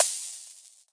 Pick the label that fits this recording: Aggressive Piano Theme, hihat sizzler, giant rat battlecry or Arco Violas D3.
hihat sizzler